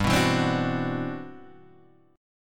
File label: C Major Sharp 11th G